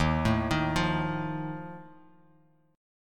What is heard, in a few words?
Eb Suspended 2nd Flat 5th, Bass Standard; Ebsus2b5 arpeggiated; JGuitar